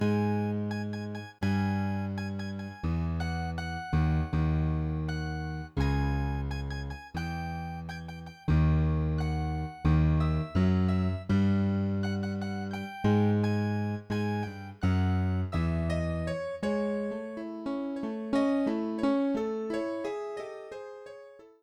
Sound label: grand piano